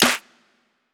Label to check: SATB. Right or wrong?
Wrong